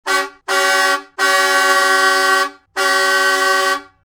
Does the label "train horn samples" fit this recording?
Yes